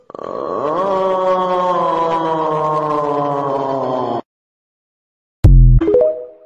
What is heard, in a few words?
brain rot ughhhhh sound